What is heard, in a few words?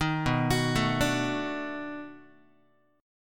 B 7th Sharp 9th, down, Guitar; arpeggiated